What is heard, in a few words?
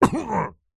human male vocalisation hit pain cough slight growl; zapsplat